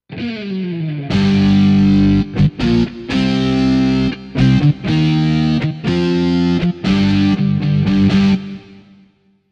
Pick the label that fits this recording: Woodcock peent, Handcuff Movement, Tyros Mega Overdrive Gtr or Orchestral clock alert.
Tyros Mega Overdrive Gtr